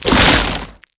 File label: open fast, zombie blind jaws; Half Life Alyx; creatures